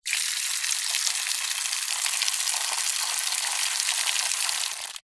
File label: faucet outside tap turn on run off; household; zapsplat